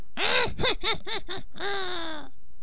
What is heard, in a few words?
gnome laugh